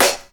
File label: old school natural hip hop acoustic snare sound C sharp key